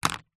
household remote control tv plastic set down table; zapsplat